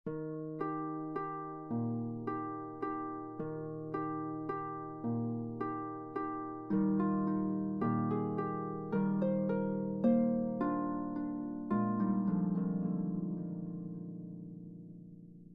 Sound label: Zither Carol, harp; christmas carols carolau nadolig for one or two harps